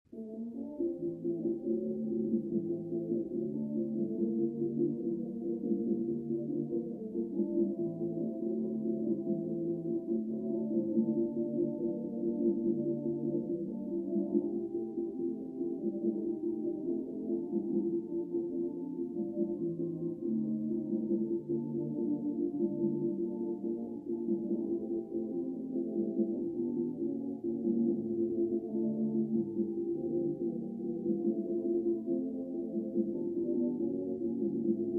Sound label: Mx Elevator Latin